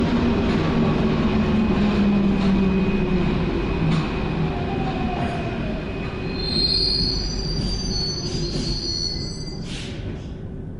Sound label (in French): arret, Metro